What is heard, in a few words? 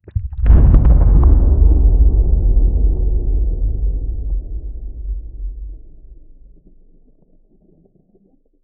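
medium underwater explosion; reflections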